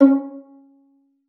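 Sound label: violin pizzicato C#5; Xsample VOL-5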